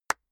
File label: clapping very slowly